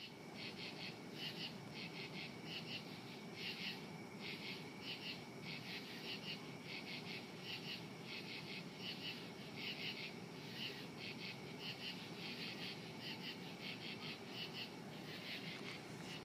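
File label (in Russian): катидиды летней ночью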